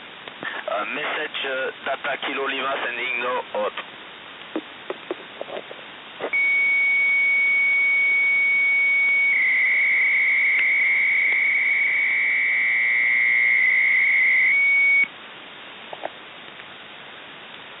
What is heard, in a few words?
NATO KL43; Belgian MIL station sending KL-43F data message; KL-43F CRYPTO TERMINAL